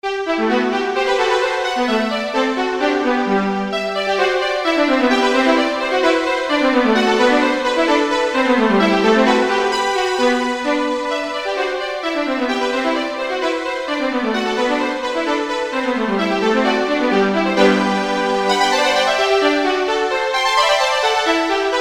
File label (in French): duo pour violon; chauveau f bruno lenurva; 1er mvt